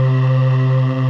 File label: SYNVO; Greytsounds; Scott Peers Sound Engineering